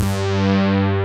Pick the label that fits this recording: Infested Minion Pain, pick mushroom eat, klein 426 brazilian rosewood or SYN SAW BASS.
SYN SAW BASS